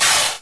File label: Scorpion Struck; Knight Online